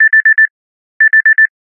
Digital Beep, Ringtones; Samsung; Galaxy Watch3 SM-R845F

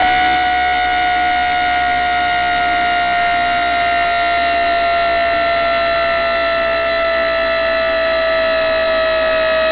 Camera Zoom Out; Samsung; SCH-A915

